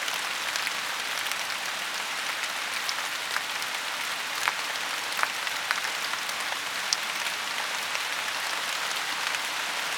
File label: ambience rain; lucius